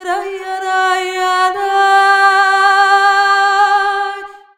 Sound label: VOX, Balkanish Traditions